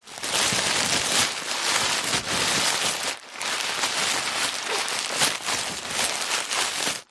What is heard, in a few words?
plastic bag thick reusable handling rustle long; foley